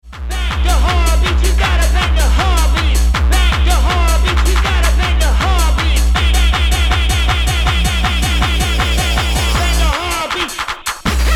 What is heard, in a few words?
Hard Beat; CHR Records